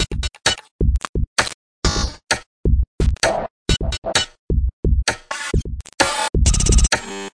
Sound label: 130 glitch techno noise burster